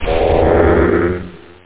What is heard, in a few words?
chant